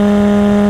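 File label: engine exhale, advanced engine sounds; engine2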